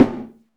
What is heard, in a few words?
Dirty Snare; Roland BK-5; Snares